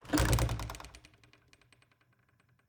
Cabin, front door, split door, oak, large, iron fittings, open, hyper, wide, 2 mics; Eiravaein Works; Vaeyan IV